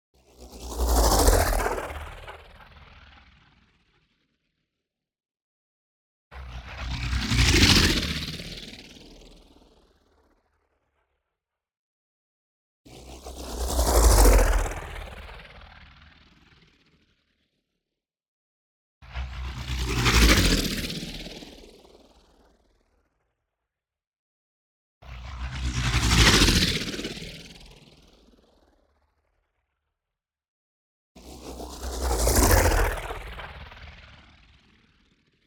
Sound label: NEON WHOOSH SMALL FAST Buzzy Swarm; boom library